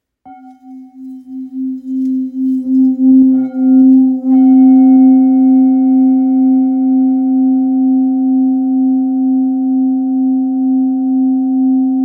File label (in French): Bol full moon rose 1.46kg do